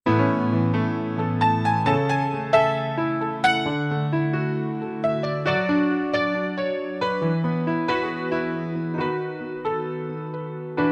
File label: Vintage Grand Rhodes